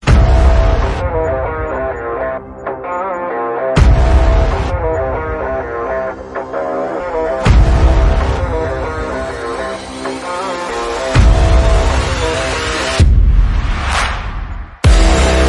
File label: Powerful gym rock